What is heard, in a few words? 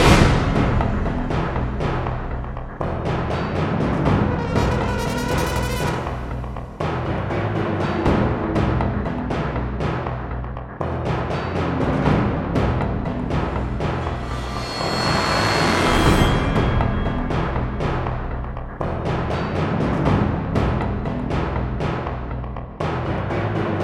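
percussion loop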